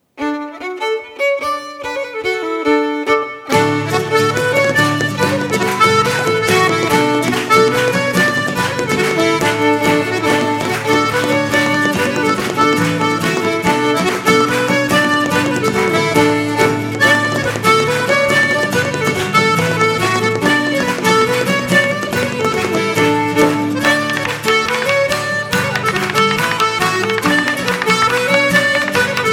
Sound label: Instrumental